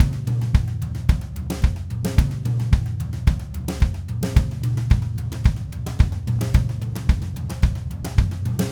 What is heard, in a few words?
Red Maple Groove 110, DW Red Maple Kit